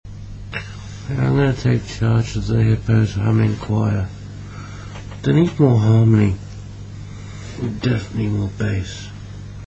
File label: hippos Choir